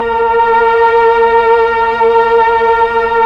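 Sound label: Pad Tron Violin & Choir A#4, Slow Str+Vox; Keyboards of The 60's and 70's; Melo. Str+Vox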